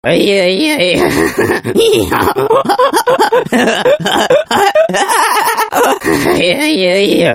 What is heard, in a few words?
frogs laugh